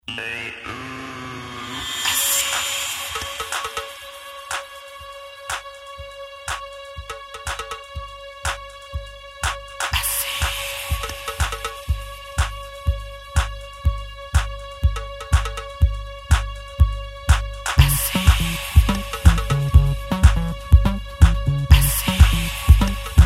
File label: bassline